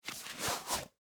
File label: Tennis Shoe Off, Foley, Tennis, Shoe, Take, Off; Shoes